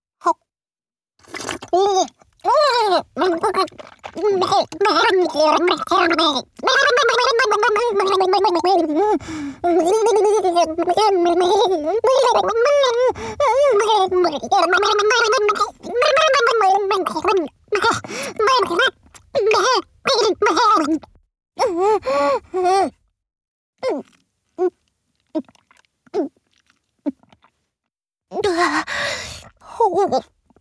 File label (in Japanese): 口内放尿→飲尿, チュパ音; チュパ音抜粋集